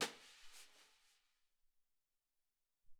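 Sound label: Brush Swish, Alesis SR-18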